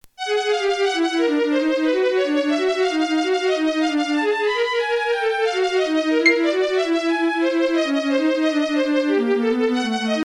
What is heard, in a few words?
Weather Sonification